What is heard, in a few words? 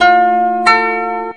KOTO LOOP